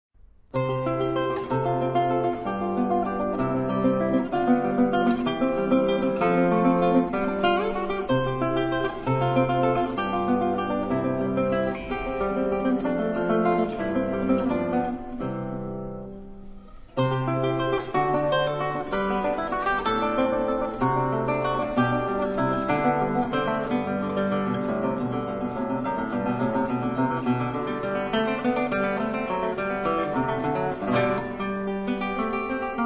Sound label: arp